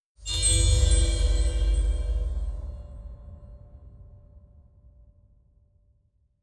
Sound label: shadow bell